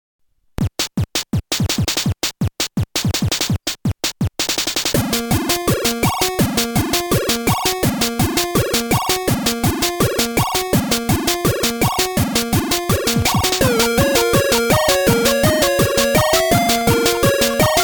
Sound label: 8bit rocker